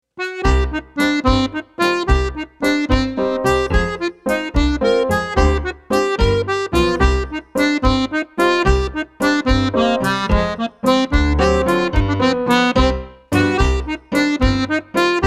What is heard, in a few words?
Treble Jig, Irish dance music; Fork in the sugar bowl